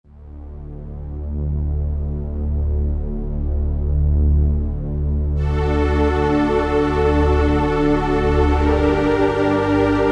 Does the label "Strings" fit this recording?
Yes